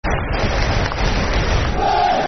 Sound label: Bury Clap Football Chant; Bury Bfc